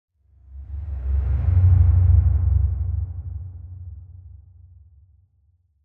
Whoosh Dull Dark Distant Scary - Sub Passing; Hollywood Edge; sound designer's toolkit v2

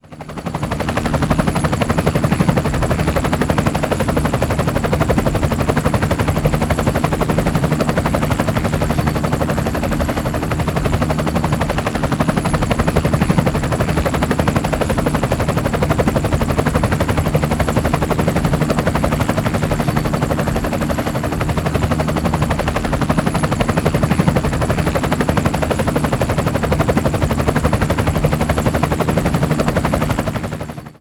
Traffic Helicopter, foley

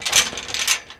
Gate Metal Unlock; Doors

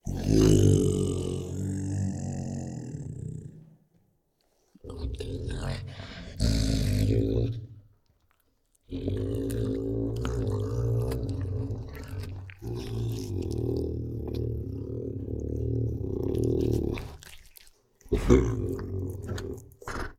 Many Tired Growls, Licks and Yawns, Tigers; Sound Ideas

